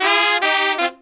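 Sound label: Pop & Funk Brass, 110 Fm, right; Best Service ProSamples vol.25; AKAI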